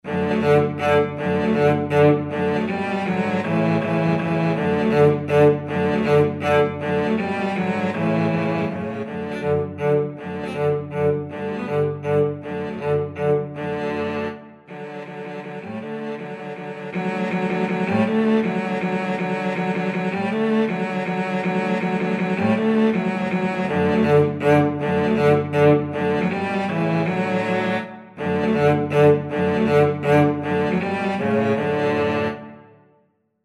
cello quartet; autumn

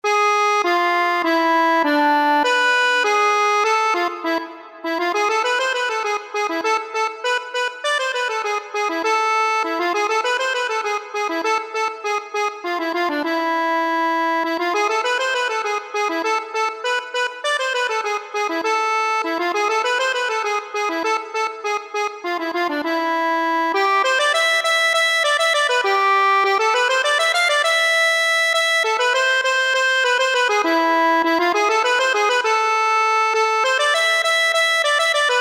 freylekh zain, accordion

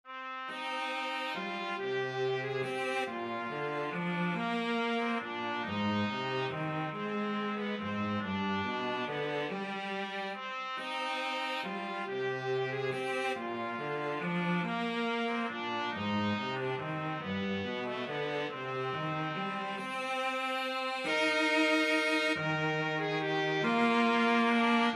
trumpet cello; trumpet-cello duet; greensleeves

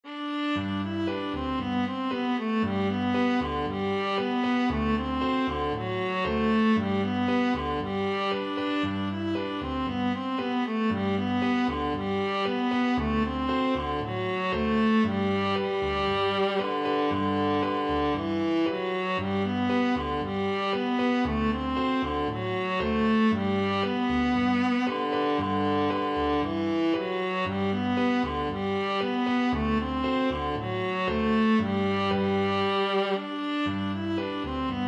viola

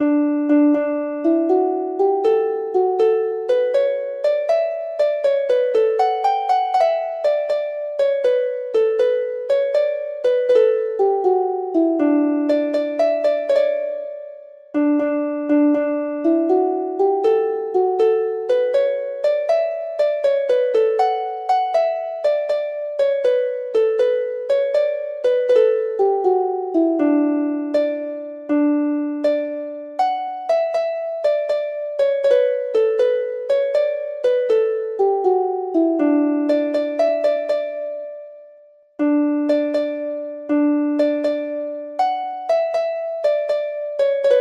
harp

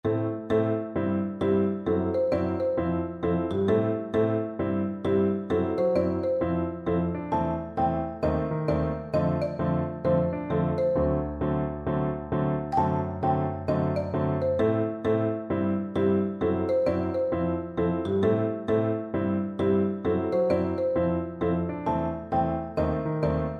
bob cat, percussion

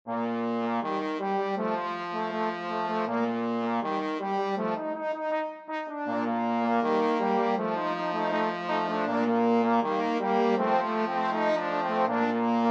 la bamba trombone

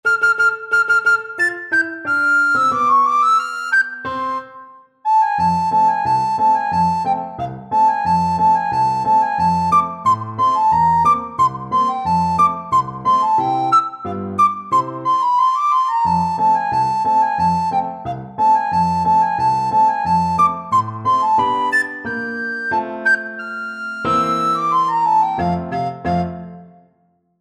recorder

